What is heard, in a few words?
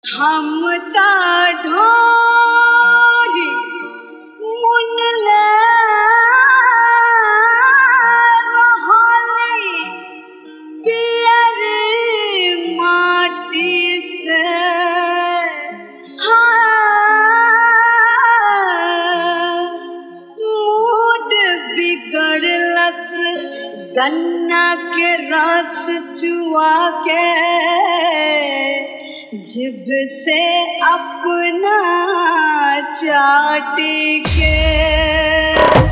Full Dhollki Bass; Bhojpuri-Hit